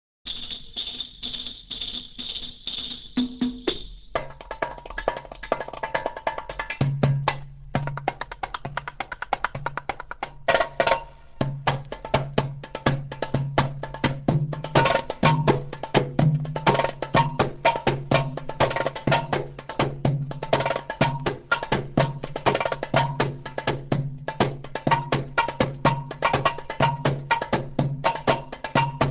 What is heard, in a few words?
Egyptian Drums, Baladi; Belly Dance Performance